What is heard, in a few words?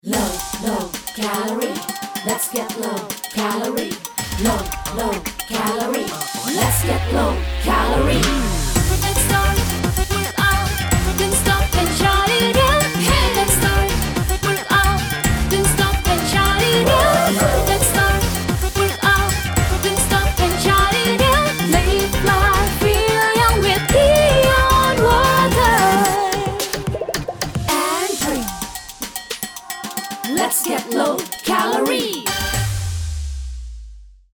Jingle